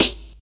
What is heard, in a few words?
00s crisp snare single hit g sharp key